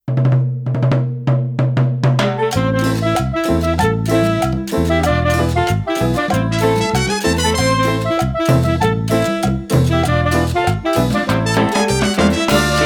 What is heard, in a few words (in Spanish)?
Cumbia Guera Mix